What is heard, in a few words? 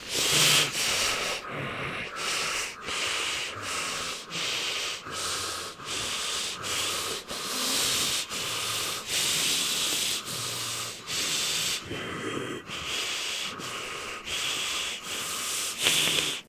Snowman Breathing; Phasmophobia